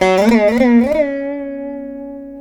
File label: VEENA; Spectrasonics; Heart of Asia